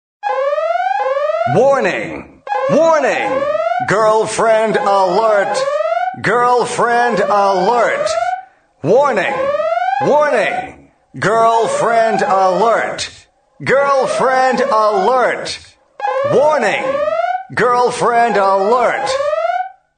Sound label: girlfriend alert funny phone tone